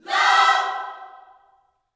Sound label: LOVE CHORD, Gospel, Vocal; ILIO; Vocal Planet